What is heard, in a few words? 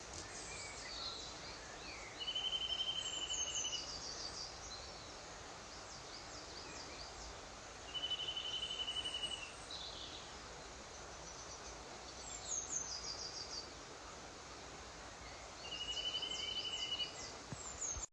Bird